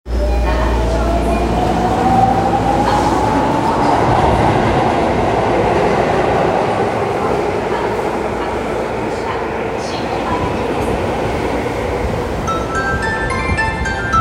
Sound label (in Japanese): 有楽町線永田町東, train sounds